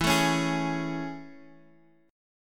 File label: A Major 7th E